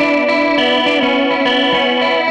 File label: Gretsch Guitar Loop